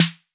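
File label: 808 snare drum; Wizoo - Platinum 24 Electronic; CHR SD 808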